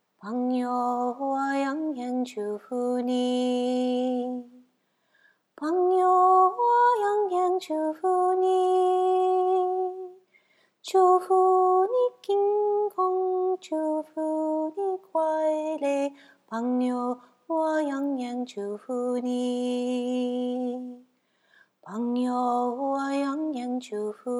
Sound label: Chinese Song